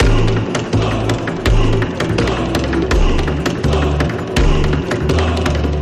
Win Summary loop; Tiki Mania

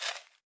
Reel Stop; Temple of Luxor

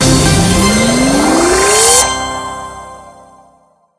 All Achievements; Lock A Luck

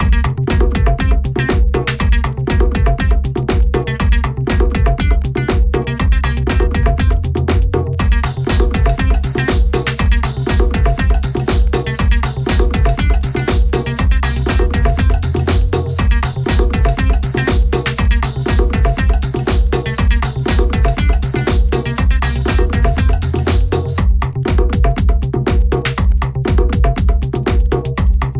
bonus music loop; genesis; euro Golden Cup

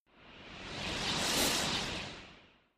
enemies vanish; Skillzgaming; battle royale desktop

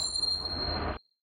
coin fly; adelia The Fortune Wielder; Foxium

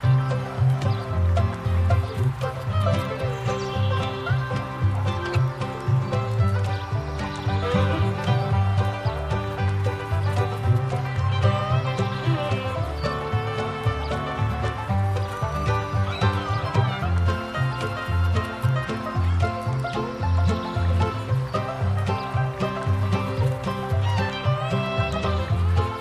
Base Music Loop; oink Country Love Desktop; Mahi Gaming

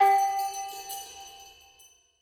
Scatter Reel Stop; Wu Xing

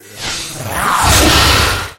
lose; Recode; rampage riches; bonus chronos

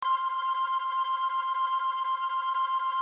summary counter loop; Rabcat; robin Of Sherwood

